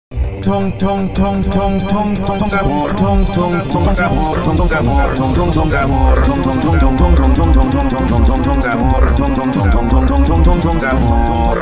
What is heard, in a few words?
reverb, slow